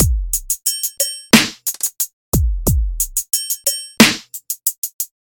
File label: Modern Bt loop